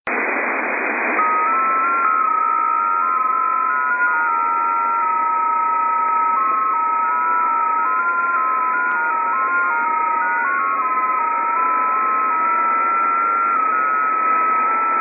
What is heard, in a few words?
JT65 Signal; digidecode; SDR2